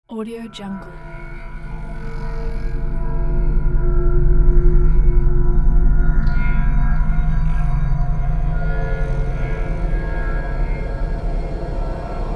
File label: otherworldly astral drone